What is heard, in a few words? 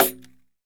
Pandeiro Finger Rimshot; Best Service; Festa Latina VOL-1; Single-Hits